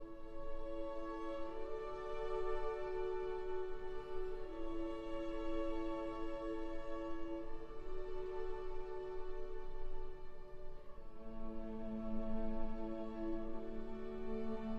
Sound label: for cello and strings